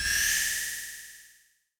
repair weapon; Allegiance

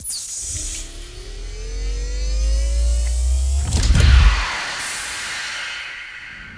shield up; Allegiance

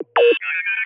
receive personal chat; Allegiance

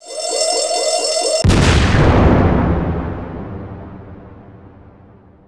eject; allegiance

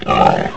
oink; Allegiance

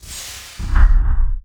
door open station; allegiance

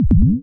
valid selection; allegiance

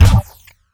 group weapons; Allegiance

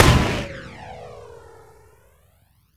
machinegun; Allegiance

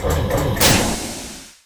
out of missiles; Allegiance

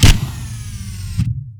minigun; Allegiance

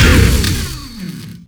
turret gun; Allegiance

